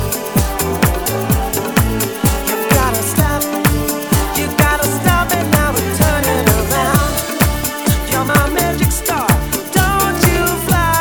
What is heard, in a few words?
Vocal